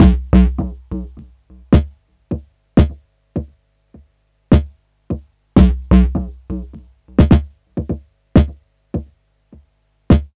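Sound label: EARTH bass drum, drum loop; Big Fish Audio; Uncivilised G'rooves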